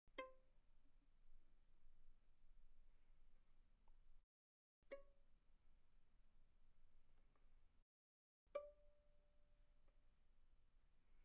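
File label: Violin pizzicato pianissimo sul A C5 B5; Strings; violin2012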